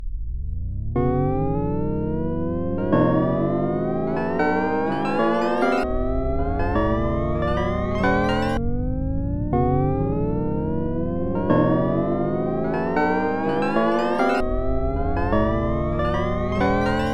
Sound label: extreme drift